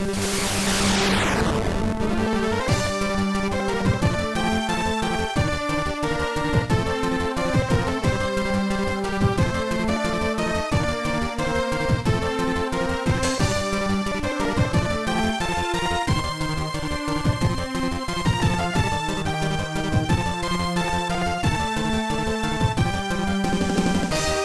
Battle; Lufia Ruins of Lore; gba

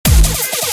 Dubstep

